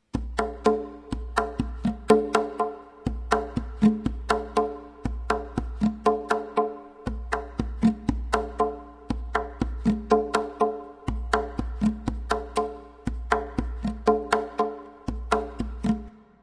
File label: tonbak